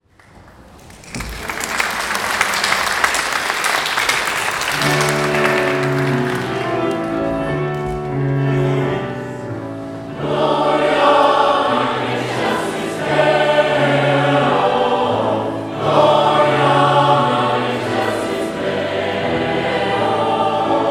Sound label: Christmas Fanfare